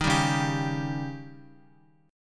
C sharp Suspended 2nd Flat 5th, Guitar; strummed; x,x,3,0,1,3; 4F,4A,5C,5G,5C,6E

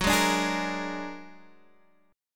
A sharp Augmented 7th, chord

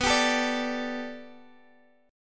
B Minor 7th Sharp 5th B, chord; strummed; x,x,9,12,10,10

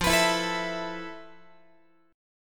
F Major 7th Flat 5th, Guitar; strummed

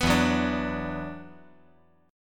E 7th Suspended 2nd, down

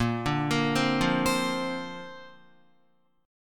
Bb Major Add 9th, Guitar; arpeggiated; JGuitar

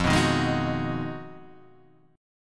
B 6th F, guitar chord; B6/F Chord {1 2 1 1 4 2} strummed

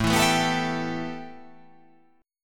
A 7th Suspended 2nd

